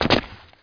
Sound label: land basic; MOTS